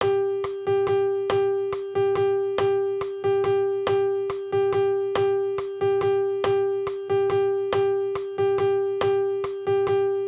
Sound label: sicilienne